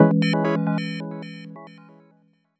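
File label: C#m chord; CyberBoi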